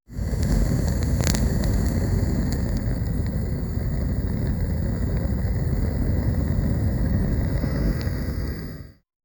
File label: sound gas fire